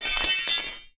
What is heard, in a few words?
impact metal rod drop solid ground